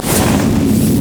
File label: dragon basic attack; monsters; LoL